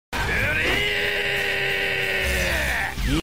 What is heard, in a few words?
wryyy